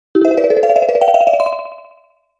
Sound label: Item acquisition - Level Up